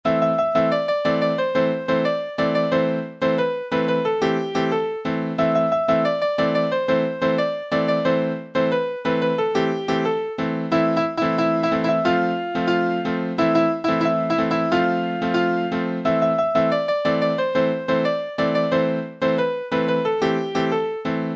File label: Piano